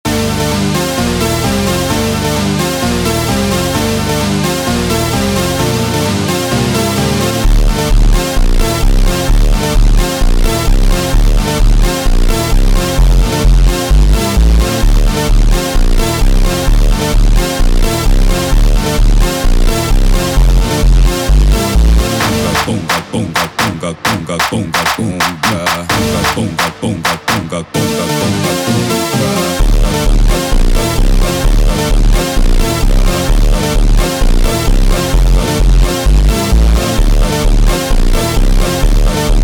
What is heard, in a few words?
Automotivo, funk